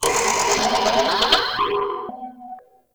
Energy Scream; Ambience; Warframe; Corpus Railjack

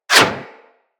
Bullet Parry Metal; Impacts; Bullet Parries

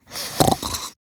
Snores, Grandmother, Vocalizations; Warframe; Deimos

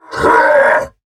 Heavy Laugh; Warframe; Battle; Dax; DaxHeavy

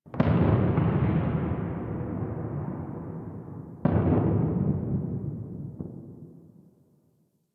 Ambient Distant Explosions; Nineteen Ninety Nine

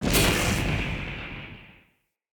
Tesla Mini Game Charge; Encounters; Ode Pollution Tower

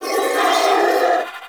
Sentient Symbilyst Pain, Barks, Enemies; Warframe

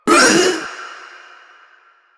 Proto Infested Runner Vocal Short Attack; Warframe

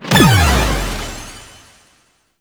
Hi Score Revive; Warframe; Nineteeen Ninety Nine; Deep Archimedia

